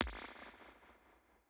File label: Kicks; Touch Loops; CosmicFunk